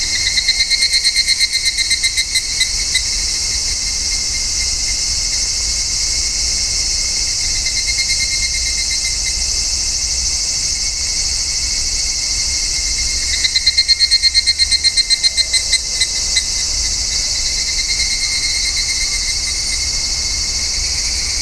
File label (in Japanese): higurashi